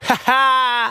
ha ha, ooh ahh

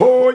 "ho", voice

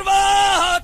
shouty, stabs

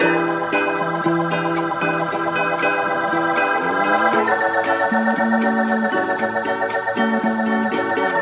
guitar, Loops

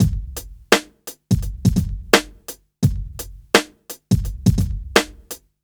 easy variation, Boombap drum loops

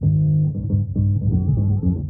bass, Loops

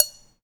jarring, Bells; Misc Percussion

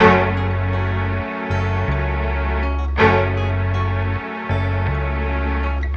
strings, Sad

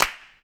Snares, Clap n Snap

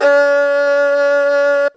String

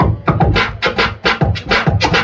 scratch beat; Drum loops

